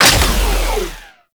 Sniper Reload Start; Weapons; Warframe; Sentient; Caliban Prime Sniper

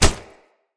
Unarmed Punch Impact Flesh Armour Hollow

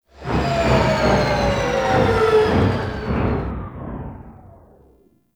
Crew Ship Decelerate, Engines; Warframe; RailJack; Grineer Crew Ship

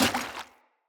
Heavy Grunt Boot Walk Water, Footsteps; Enemy; Sinecure

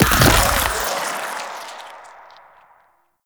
Molt Cast; Warframe; Saryn

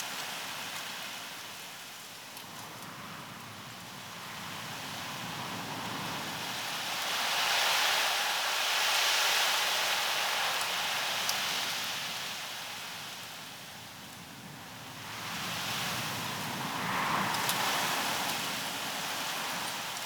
Night Wind Grass Small Loop; Ambience; Props; Warframe; Eidolon Plains

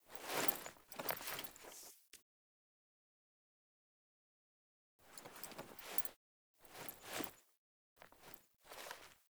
Handshake Foley; Verminia Quest

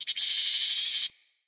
Meadow Close Grasshopper; Day Animals

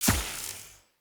Idol Projectile Default, Weapon Impacts; Idols

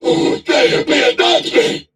Surprised; Warframe; Corpus Crewmen; Crewman E; Dialog Barks Battle

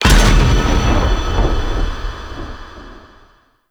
Void Dragon Weakpoint Destroy; Enemies; Gameplay; Warframe; Duviri

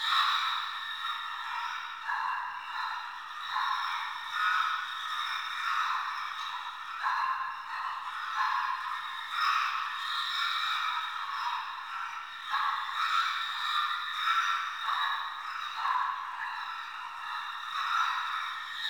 Chatter Loop Interior; Warframe; Ambience; Sentient Lair

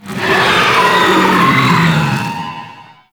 Pain; Warframe; Tau; Sentient Dactolyst

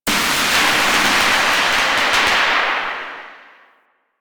Corpus Powerplant Lightning Storm; Ambience; Warframe; Corpus Railjack; Tether Power Plant

